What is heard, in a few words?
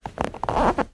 household chair sofa squeak creak as person gets up off